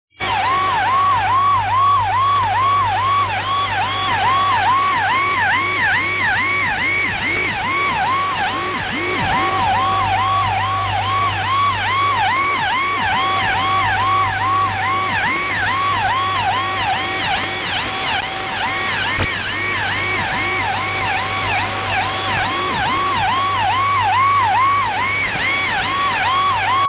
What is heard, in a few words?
jammer; 12140 am; avare